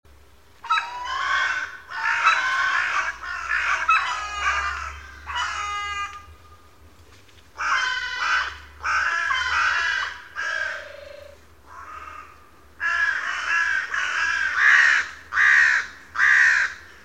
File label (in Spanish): corneja